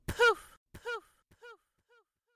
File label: poof echo